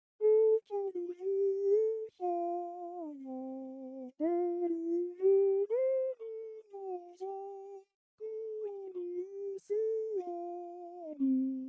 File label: Guitar C3 D4; KAITO-Guitar2